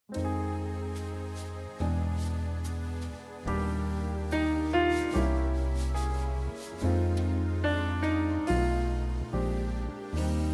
Solo

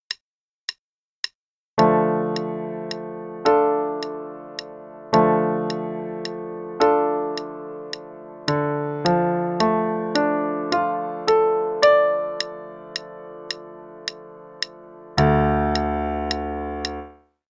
Dm Chords, qn=108; Level 2A - 2nd Ed

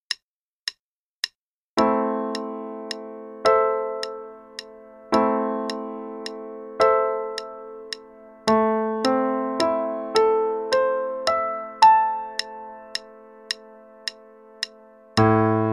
Am Chords, qn=108; Level 2A - 2nd Ed

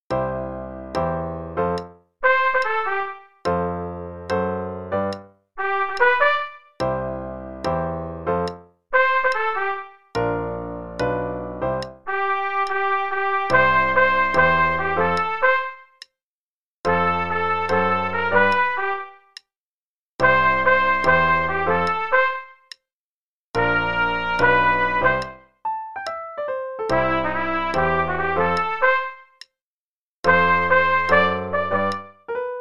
Play-a-long, both parts, hn=72; Are You Ready!; trumpet

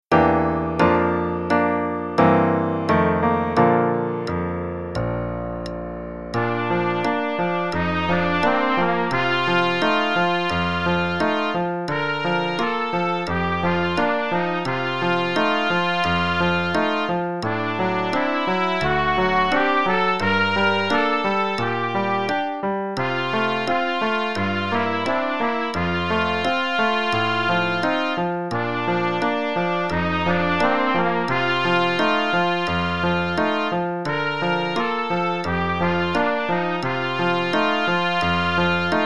Play-a-long, both parts and click, qn=88, trumpet; Illiad; in Bb

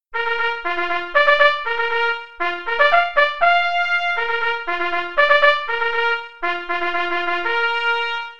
Bugle Call Drill Call (qn=120)

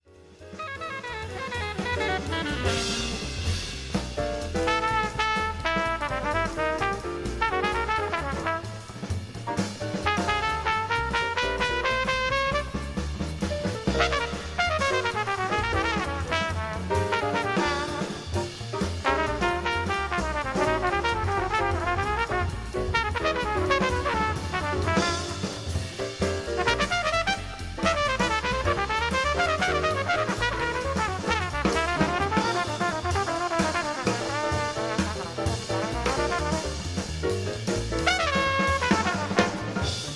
solo only, jazz trumpet

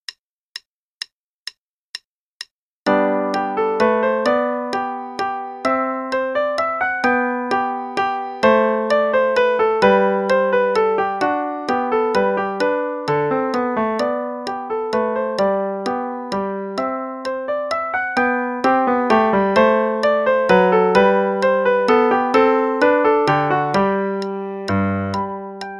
Minuet in G Major, no repeats, qn=130; Bach

